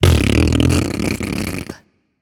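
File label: tongue, female voice; smite; voicepack; hera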